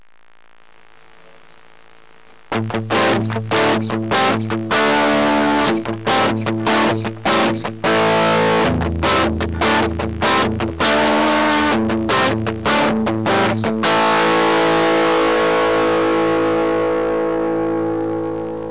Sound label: mxr Silicios, Distorsion+